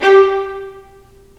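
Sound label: violins marcato, String Sections; Roland LCDP13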